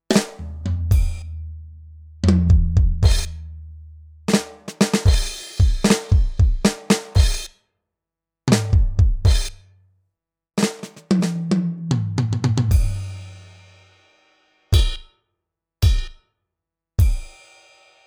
Choke Test

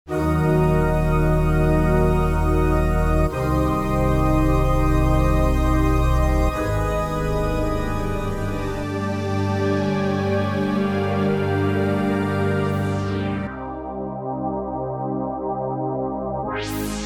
Pads

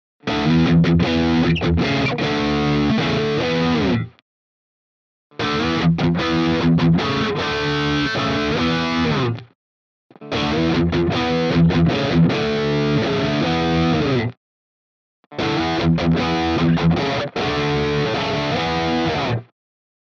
Ibanez AZ SDB high gain Riff

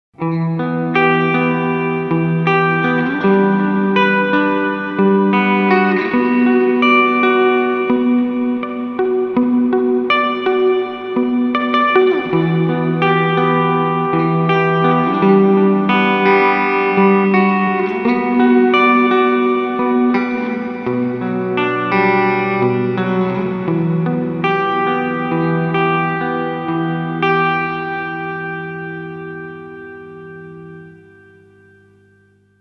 SP2016 Reverb; Eventide H90

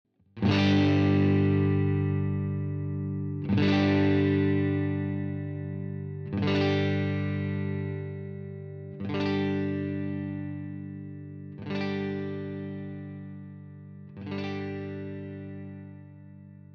Clean Up; UA Lion

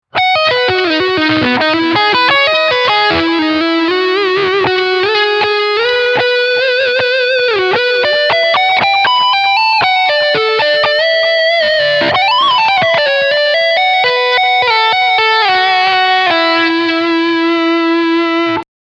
ibanez js140 wh

